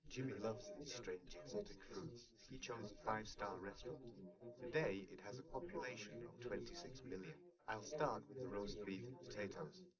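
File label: male, live recording 130ms 1m; SiSEC11; underdetermined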